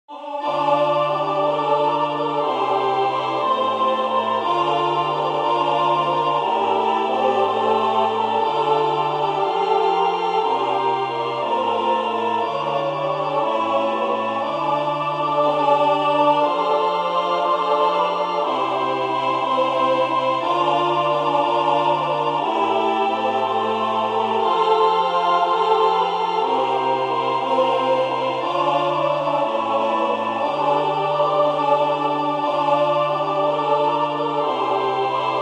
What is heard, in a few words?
tutti